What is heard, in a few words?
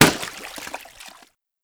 Water Balloon Impact